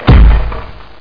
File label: crate; 10,054 sample rate